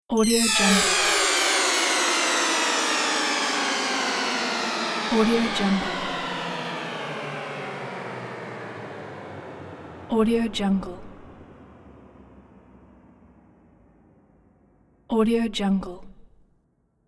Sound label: game time is over